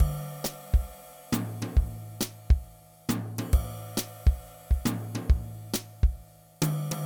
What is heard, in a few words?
Lounge Jazz Drums, loops